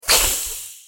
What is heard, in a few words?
dilophosaurus hiss; crossyroad; dinosaurs